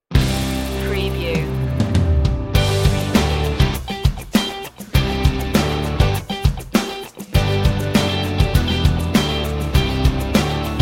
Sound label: no melody